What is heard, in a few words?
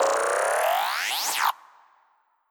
Quetz Solaire Rise